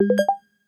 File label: conveyor item centred